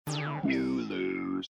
dice

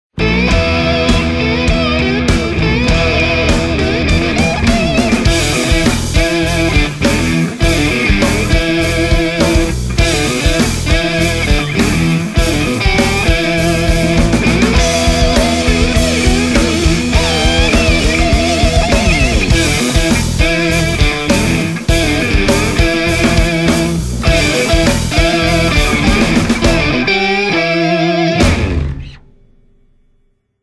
Nokia Tones; Windows Phone apps

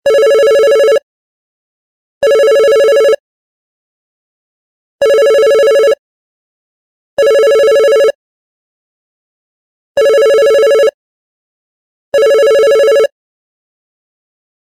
Vintage Bell, ringtones; LG G8

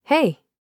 Hey, notifications; Google Pixel 4; Pixel Sounds

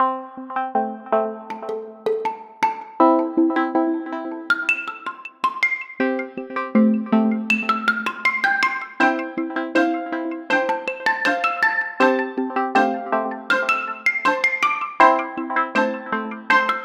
Organic, ringtones; Motorola Moto G

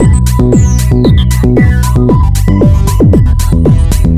ringtone, electro; Google; NewWave Labs

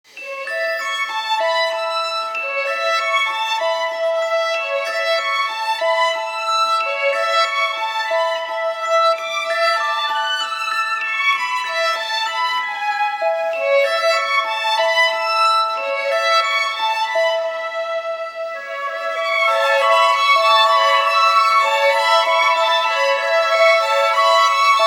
Ring Delicato; Vertu Constellation Quest

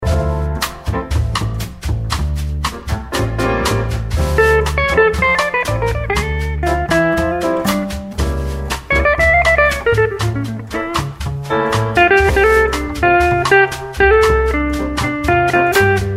Latin Jazz; Huawei Honor 3

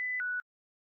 Low Battery, ui; Fujitsu F-02J; rakurakuphone

